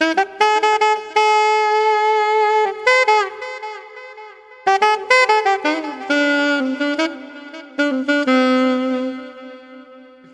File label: sax, Loops; Phonky